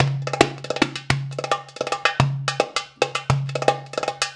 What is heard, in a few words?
PERLP, ArabianTraditions